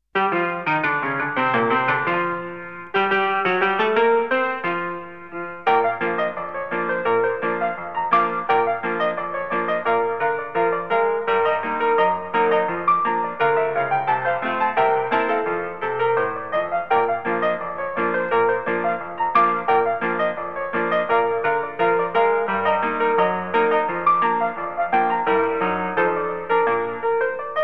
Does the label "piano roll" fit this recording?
Yes